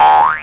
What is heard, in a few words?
boing